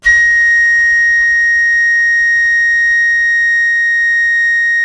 FLUTE FF A#6; Greytsounds; Philip Wolfe David Crozier's Saxophones

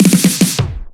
Fills 128BPM, Clubsounds

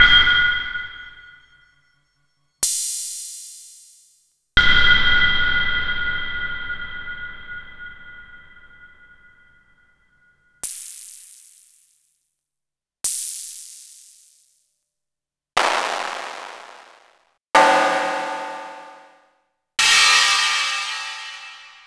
Long Hats

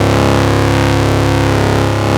ARP 2600 C#2, synthesizer; Hollywood Edge - Giorgio Moroder Rare Synthesizer Collection

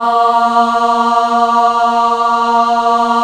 A#2 women ah, Choir; USB Soundscan vol.28; AKAI; WOMEN AHP

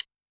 S STK HT, Snare; Yamaha DTXpress-II

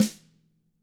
DW HIT2 PD-R, DRUMS SNRD; ILIO; Double Platinum Drums 2

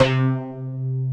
SLOPPY BASS

